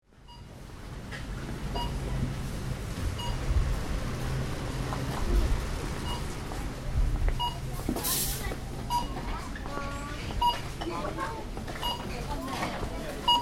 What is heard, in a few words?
chatter and beeping at Lidl